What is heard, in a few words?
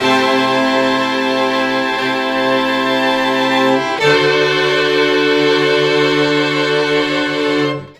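STR-CHORDS, string chords, Disco; Best Service ProSamples vol.48 - Disco Fever; AKAI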